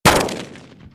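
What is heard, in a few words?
colt exterior shot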